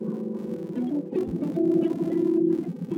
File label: Latino DUET